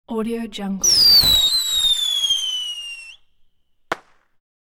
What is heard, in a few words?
bottle rocket whistle burst; hollywoodedge